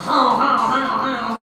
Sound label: "hauhauhau", shouts; Best Service; Hyper Dance